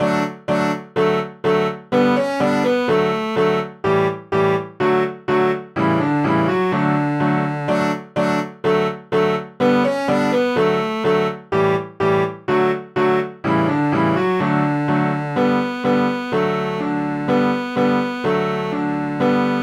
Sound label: allegro, cello; suzuki cello school